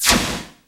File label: zap, hard percs; 90 sSampleCDs; Crypton - DrumBase5000